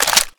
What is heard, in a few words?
mag in, weapons; cw l85a2